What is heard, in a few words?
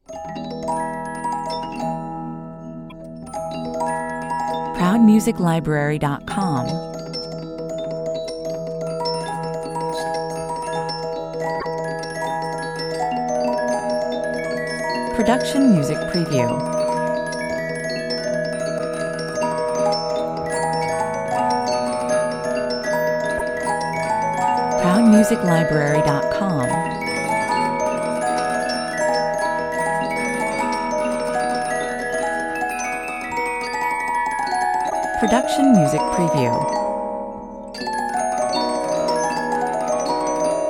cascading music box